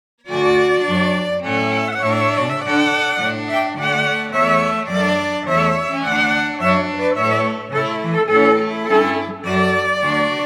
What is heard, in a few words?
Trumpet Voluntary, Strings, quartet; Clarke